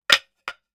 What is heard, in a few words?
STICK HIT DEFEND, wooden staffs; The AudioVille